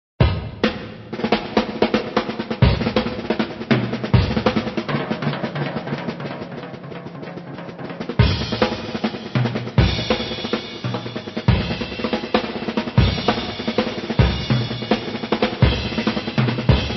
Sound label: Drum fills